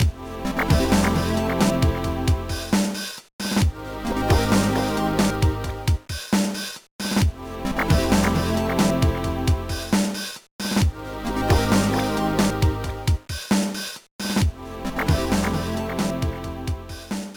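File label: background music; No No No Puzzle Chailien; GBA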